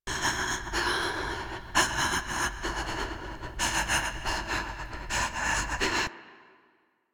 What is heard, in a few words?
Female Breaths; Dax; Warframe; The New War; Lua War Room